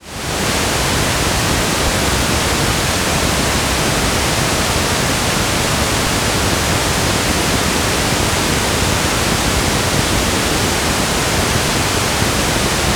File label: pink70 hi